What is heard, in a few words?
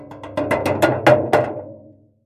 Metal hit multiple thuds